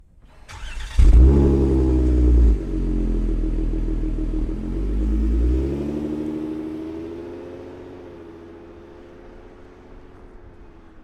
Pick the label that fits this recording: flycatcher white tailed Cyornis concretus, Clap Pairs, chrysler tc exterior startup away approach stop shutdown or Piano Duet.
chrysler tc exterior startup away approach stop shutdown